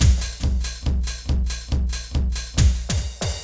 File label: techno 140